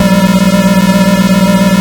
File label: Arcade Riffs